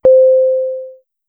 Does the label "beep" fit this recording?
Yes